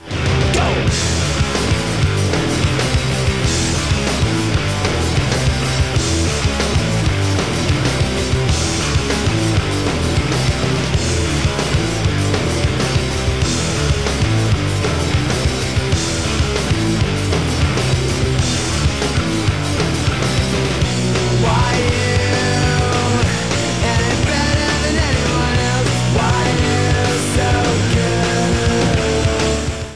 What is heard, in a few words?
punk song